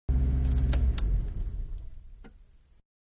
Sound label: Mid Size Car Idle Off Interior; Cars (Sound Effects:Vehicles); Car Mid-Size-Idle And Off-Interior; Manhattan Production Music